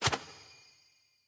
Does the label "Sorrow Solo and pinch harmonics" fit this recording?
No